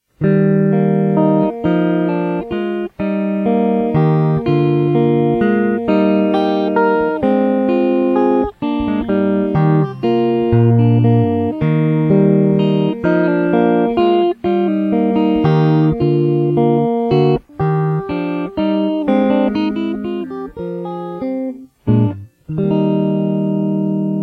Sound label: clean git